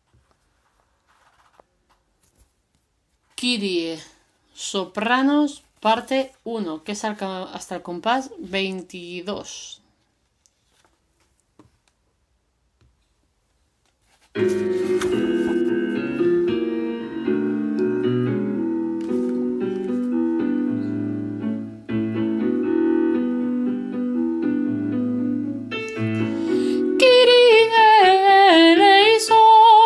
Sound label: Kyrie Soprano